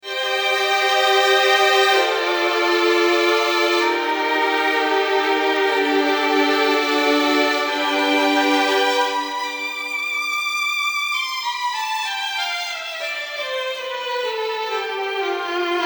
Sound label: memotron violins studio; manikin electronic